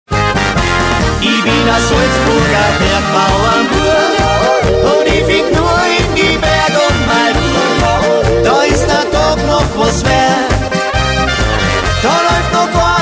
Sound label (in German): klingelton